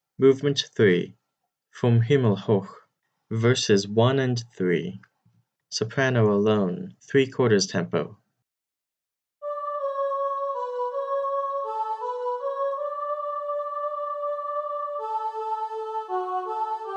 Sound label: soprano alone three quarters tempo, choir